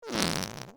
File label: whoopee sound